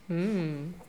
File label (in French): hum désir